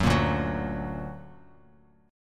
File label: D Augmented D down Bass Standard; D+ strummed; JGuitar